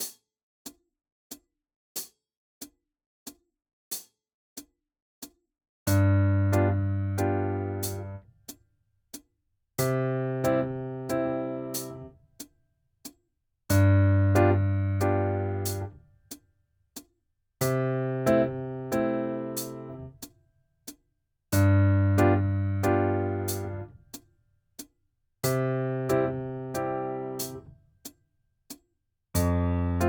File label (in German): 4 Toene Begl.